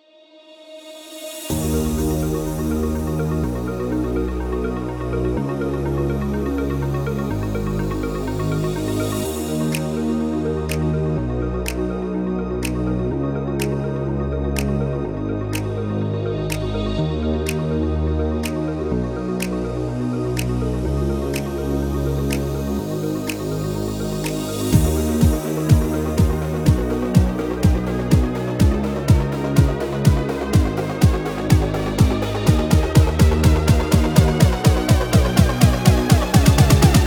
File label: Instrumental Pitched up